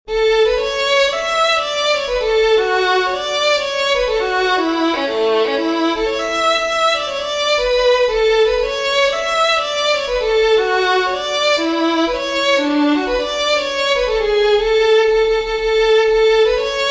Rainy Daze March, fiddlers; southern kings fiddlers

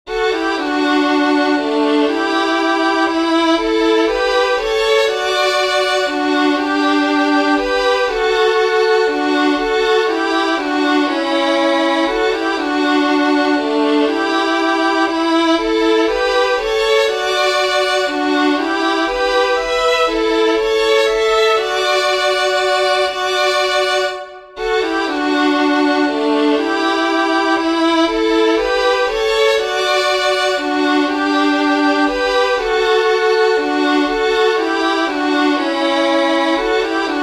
WALTZ with Harmony, fiddlers; southern kings fiddlers

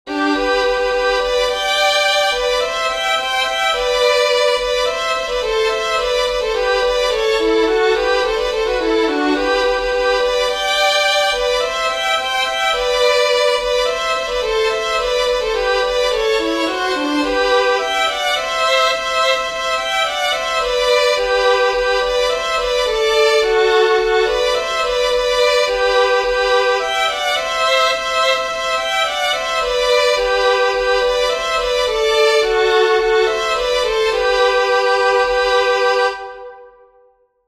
with harmony, fiddlers; RED WING; southern kings fiddlers

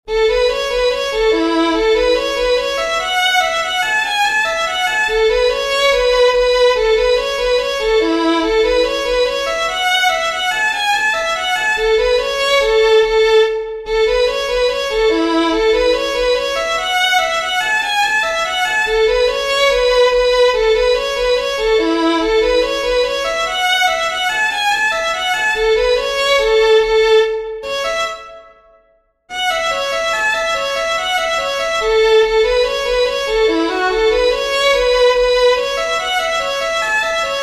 HURLOCKS REEL, fiddlers; southern kings fiddlers

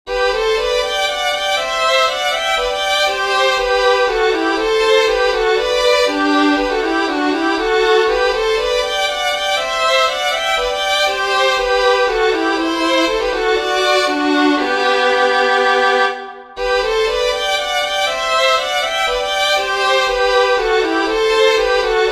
MARINO CASINO with harmony, fiddlers; southern kings fiddlers

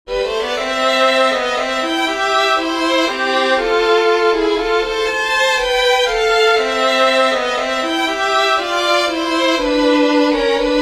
WALTZ with harmony, fiddlers; BLACK VELVET

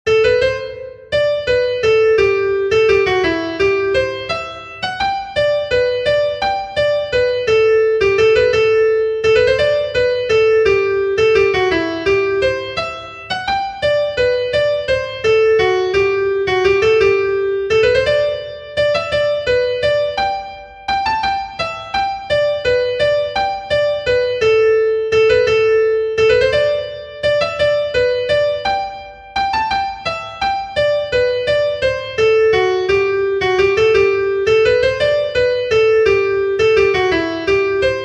WALTZ, fiddlers; PICTOU COUNTY; southern kings fiddlers

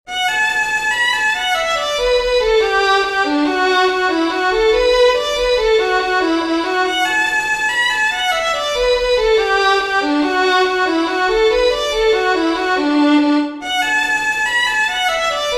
REEL, fiddlers; southern kings fiddlers

